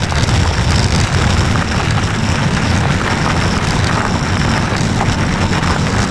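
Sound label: roll concrete crusty, terrains; Tony Hawk's Project 8; XBOX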